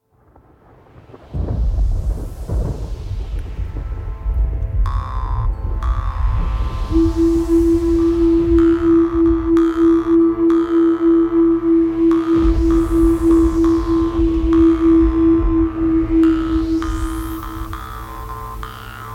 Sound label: Shaman's Jaw Harp